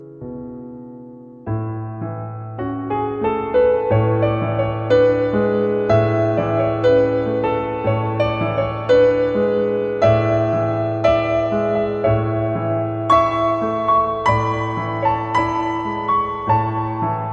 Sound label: Chopin Nocturne in C Sharp Minor